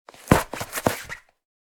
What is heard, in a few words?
Inflatable armbands putting on